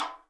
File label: REPINIQUE